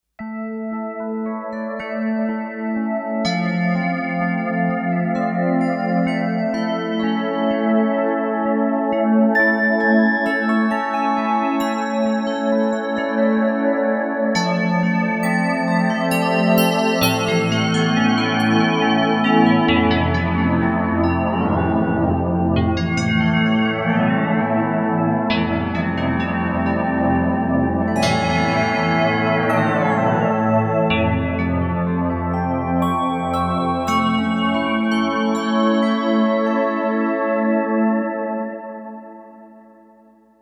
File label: Bells, Kawai K5000S